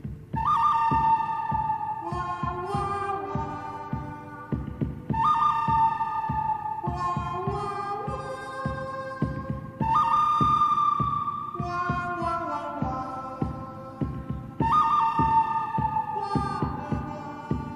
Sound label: Ringtone